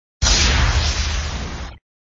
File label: Sound SFX, flipper